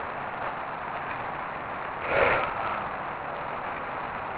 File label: Cemetary Breath; Sweet Hollow Road